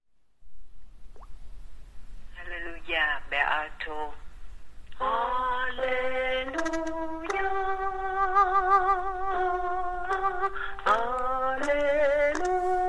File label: Alleluja Alto; ca doan teresa